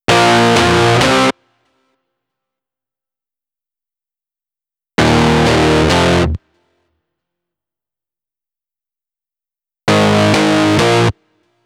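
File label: guitar futzed; mcdsp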